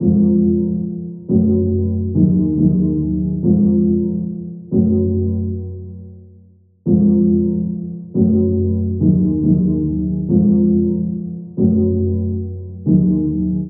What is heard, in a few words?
Loop